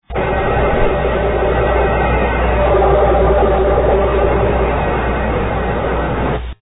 teletype

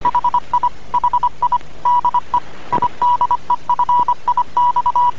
cw

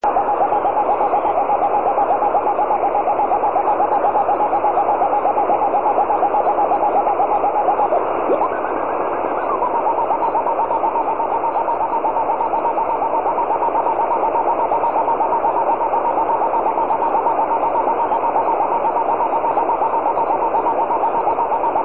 AM or FM 137mhz

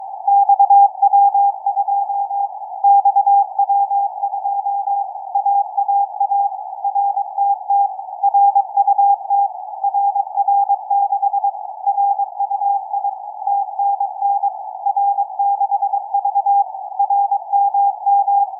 cw; XW2C CAS3C; 145mhz790

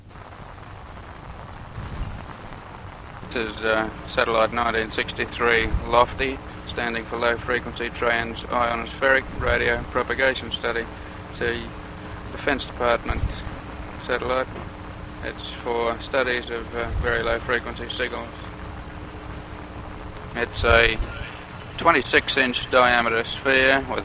satellite sounds; LOFTI-2B; Bruce Window's 1963 Satellite Sounds tape

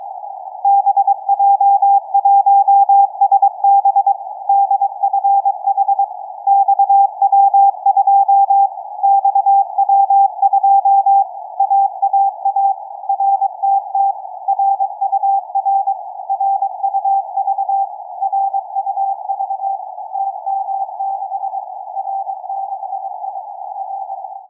XW2A CAS3A VERT 145mhz660 cw

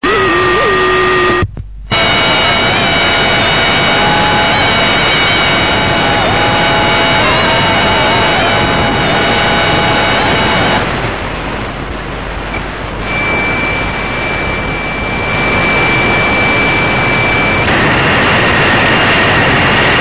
beacon

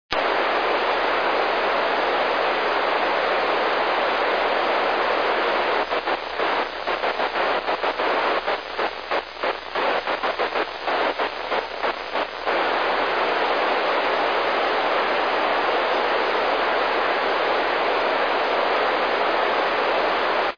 rs-22

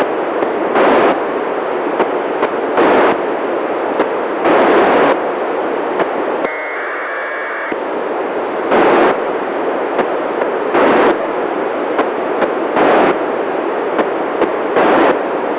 1k2 9k6; fastrac-1

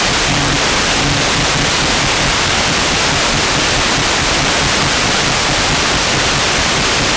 WFM 100kHz BW; Metop-B

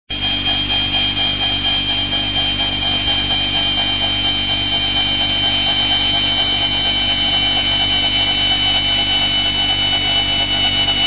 cw hum

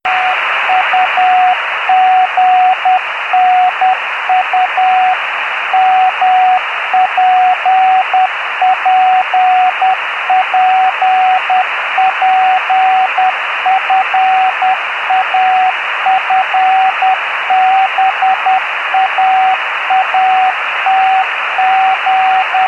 JUGNU CW; JA5BLZ Noguchi

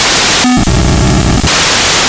FSK; DIY-1; satnogs

